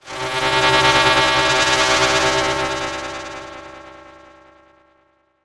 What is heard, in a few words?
Transition Feedback Sweep; Adobe; Production Element